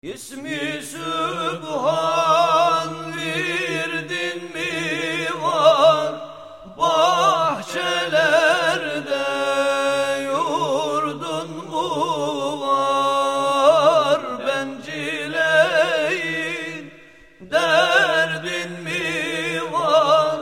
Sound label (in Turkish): bülbül solo koro